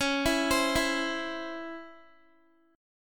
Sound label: Db Minor Major 7th Db Guitar; arpeggiated; x,16,14,17,16,x